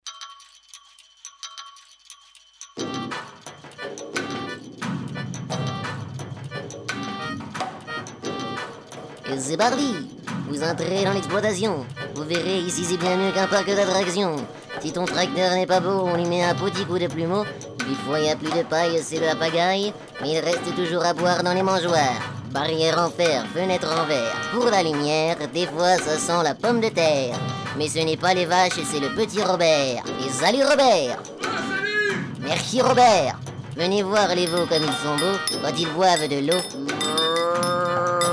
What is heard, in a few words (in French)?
Musique; Exploitation